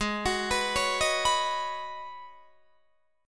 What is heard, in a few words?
Ab Minor 11th, Ab, Guitar, DADGAD